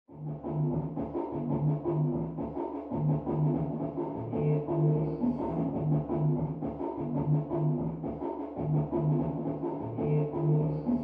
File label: Mx Electronic Dance